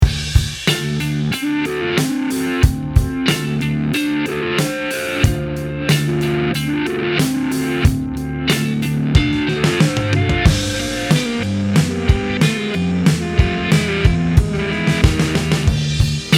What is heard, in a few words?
tie dye delay, pedal board; logic pro